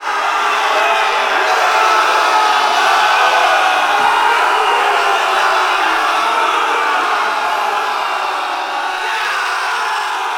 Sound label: scream